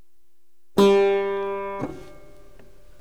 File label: clavichord A3